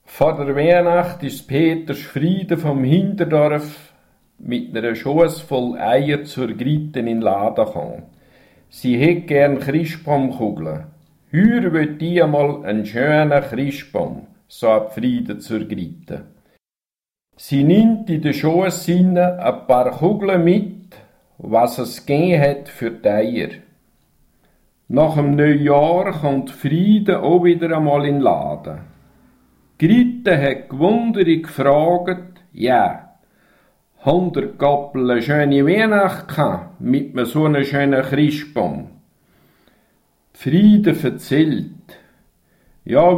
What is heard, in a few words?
sax